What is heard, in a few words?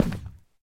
deactivate, shelf